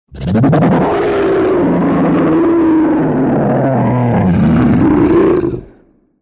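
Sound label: sounds of giant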